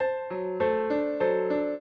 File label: trio, piano; Mozart